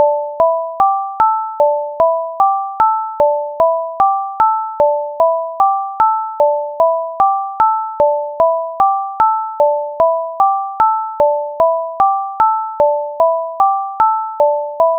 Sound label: acoustic guitar; kannada; heart feeling love ringtone